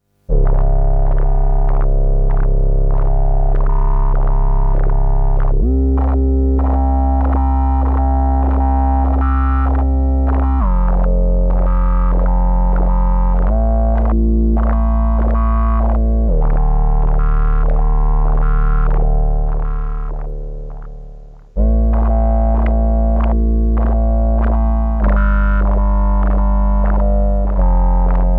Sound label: Korg S&H LPF, New Analog Patches